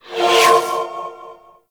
Spirit Primary Fire Whizzby

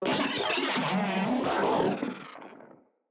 Moa Vocal Death

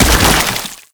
Tenno Land Heavy Sludge, footsteps; Warframe

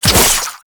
Whoosh; Warframe; Weapons; Tenno; Oraxia Flechette

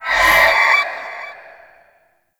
Void Meter Full; Weapons; Warframe; Zariman

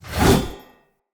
Great Sword Whoosh; Weapons; Player; Swords; Mendicant Great Sword

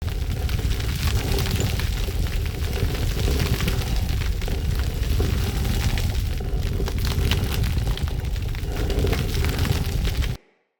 Rolling Door Loop; UnderCity

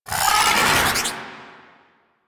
Lightning Stomp Cast; Warframe; The New War; Archon; Boreal; Abilities

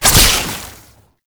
Alt Fire Shot; Weapons; Warframe; Tenno; Oraxia Flechette

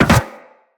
Land Soft Metal, Footsteps; Characters; Operator

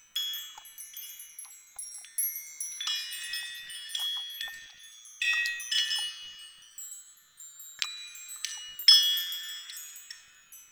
Chimes Loop; Ostron; Warframe; Ambience; Clan Dojo; Dojo Props

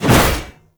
Staff Swing Sweetener; Warframe

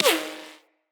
Bullet Impact Ricochet

